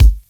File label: Kick, Kickdrum; Equipped Music; Loungin House